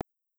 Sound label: foot TIP